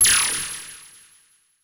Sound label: CABL TEN, CABL TENS; Total Control - Action Cutz